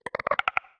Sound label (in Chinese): 炽足兽待机声